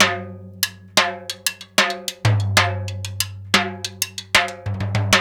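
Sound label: 93 bpm, Timbale Bash; Spectrasonics; Supreme Beats; African Contemporary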